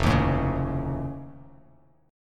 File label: F Augmented 7th F, Bass Standard; strummed; 1,6,7,6; down